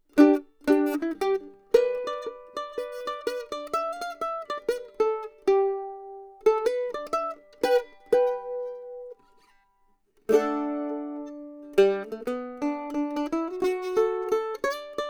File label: Mando Bullet